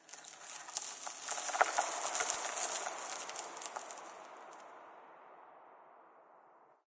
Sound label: amb rock debris, ambient organic; video games; source engine; portal2